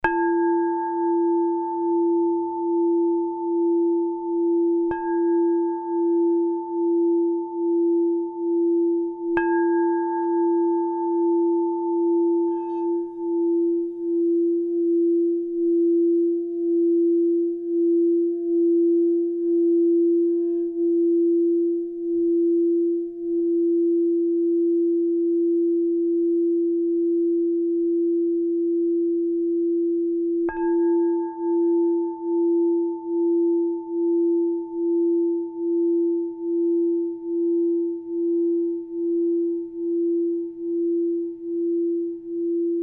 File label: Ocean Frosted